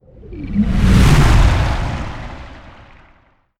Watery Whoosh Smooth Slow

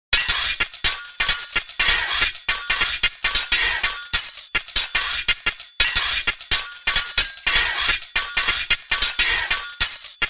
Ambient Sword Battle

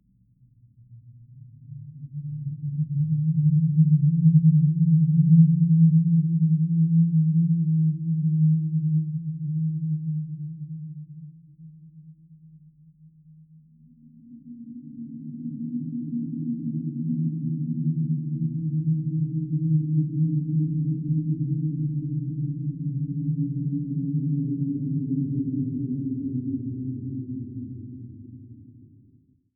Horror Ghost Low

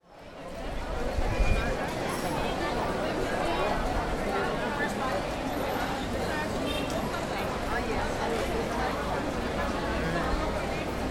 Barcelona Market

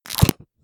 Monster Eating

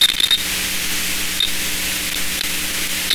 glitch; Tascam

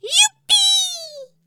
Cartoon Yuppie Shout

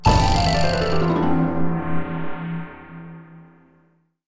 Magic Dark Teleport

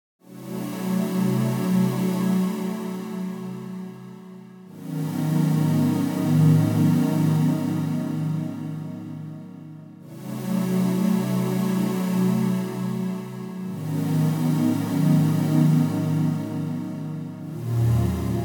Big Strings

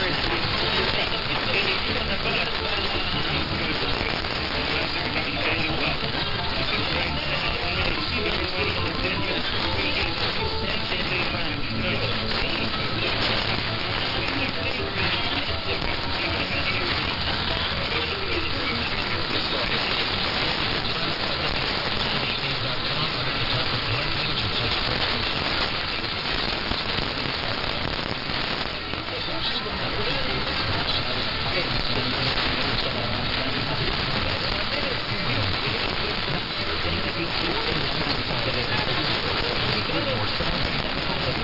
AM Radio Weak Bonaire

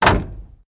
Car Door Close

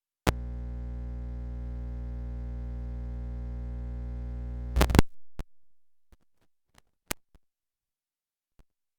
Cable Noise Unplug Plug Back In

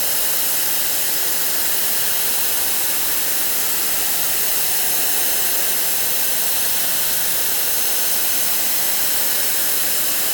Broken Tv Noise

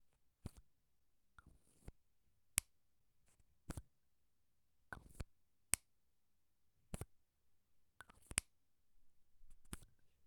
Lipice Opening And Closing

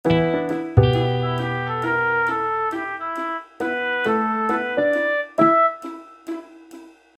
Short Jazzy Tune

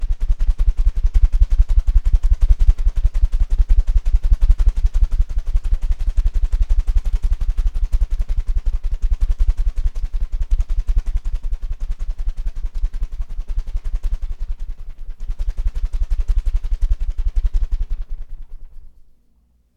Helicopter Wing

